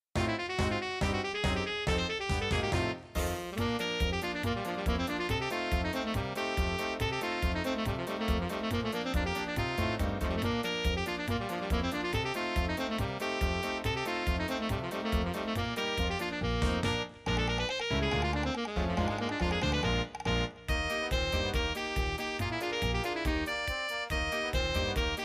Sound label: drums